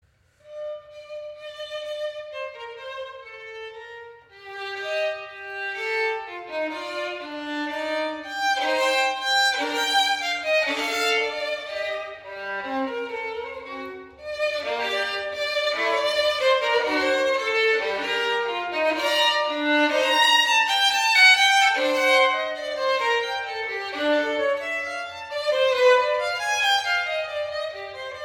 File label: Fuga Allegro, violine; bach